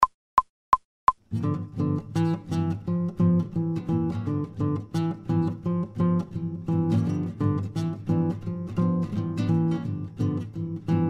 Groove fast, without metronome

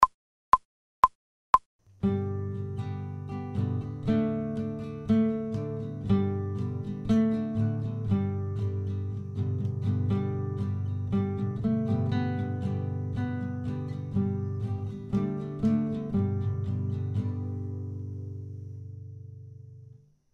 fast without metronome, guitar

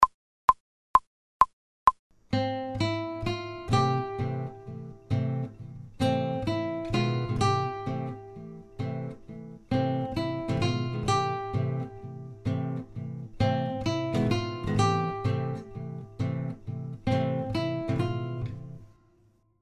fast without metronome, guitar

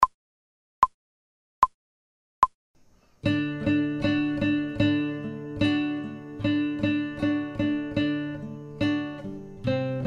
fast, guitar, without metronome

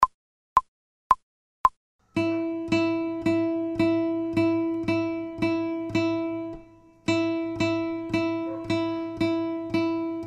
Count Fast fast

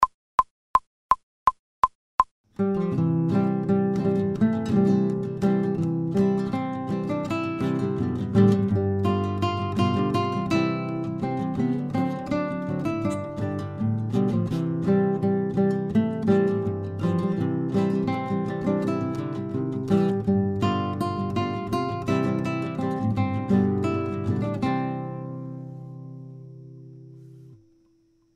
Yellow Rose Of Texas fast

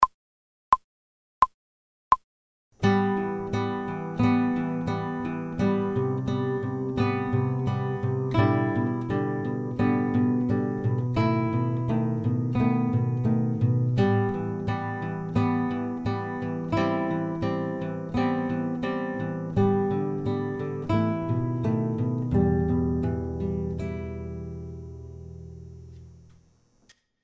fast without metronome, guitar

